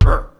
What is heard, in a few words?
pig bd; Angry Birds